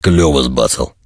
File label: reaction music; STALKER